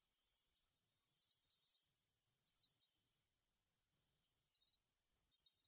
isohiyodori jinaki

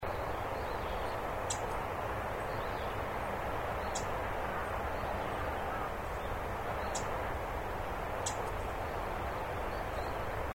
misosazai jinaki

